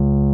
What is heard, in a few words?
RHODES C#1; Sonic Reality - Vintage Timetraveler